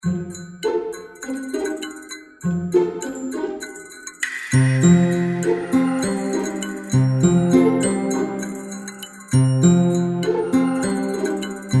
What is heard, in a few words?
bgm